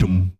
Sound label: vocal